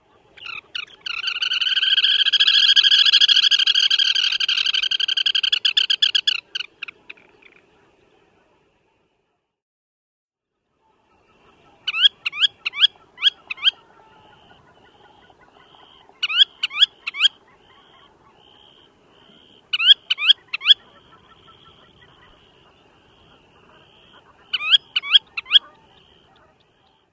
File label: Black Turnstone